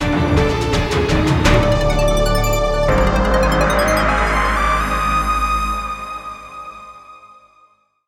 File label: Mission Success; Space Tyrant